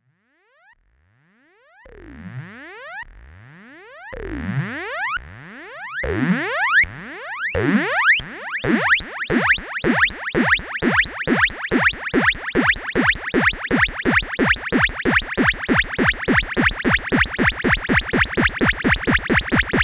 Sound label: Formant Triple LFO